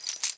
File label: dog collar short